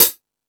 Rock N Roll, Drum Machines

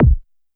BD Ana Hard; YAMAHA RM1X

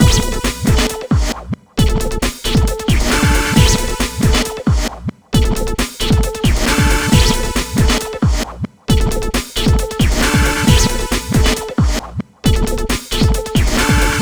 Old Schoolin, 135 Bmin, Hardcore Breakbeat; Big Fish Audio - Hardcore Breakbeat Action

